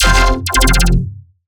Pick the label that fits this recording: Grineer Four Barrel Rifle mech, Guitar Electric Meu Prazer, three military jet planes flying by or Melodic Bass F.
Melodic Bass F